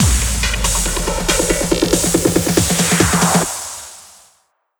140BPM 2Bars, HandsUp & Trance Fills; Foxy; Vengeance Samples

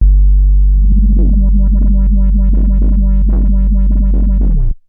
Roland B G1, Roland S100; musicradar-synth-samples

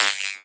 Percussive FX; Vengeance Samples; VEH1 Percussion

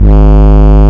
Ultimate Bass G# Root G#; Vengeance Samples

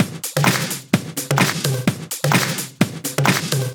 House Loop, 128 BPM; Vengeance Samples

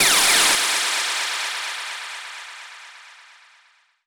FX Downlifter

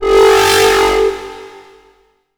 Tonal FX G

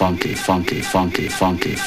House Loops, Vocal Loops House 128BPM

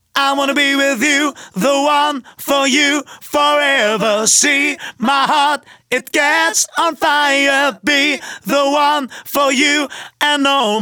Male Vocals DRY, 140 BPM; Vengeance Samples; Vocal-Hook Kits; Song Kit

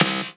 Clap; Vengeance Samples

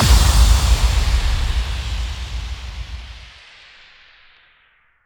Bassdrums FX, FX Kicks; Vengeance Samples

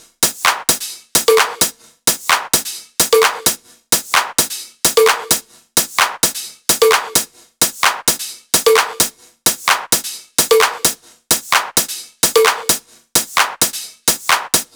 130BPM Kit; Vengeance Samples; Pressure 2 Kit

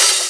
Open Hit Hat; Electro; Percussion; Electro Flux Sound Kit

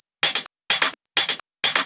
House loops 128BPM; Vengeance Samples

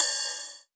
Ride; Electro Flux Sound Kit; ED Ride

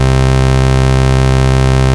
Ultimate Bass Root C; Vengeance Samples

